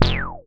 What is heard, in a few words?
Bass, 2600 F1, Synths, ARP 2600